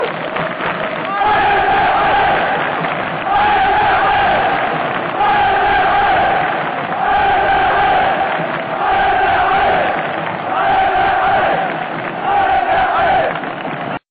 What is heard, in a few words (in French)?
Allez les verts allez, chant